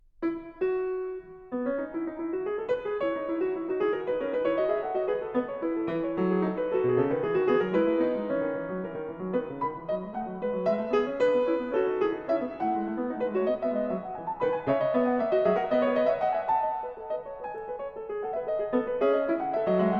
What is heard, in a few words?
Fugue, E major; BWV854; Bach; Well-Tempered Clavier; Book 1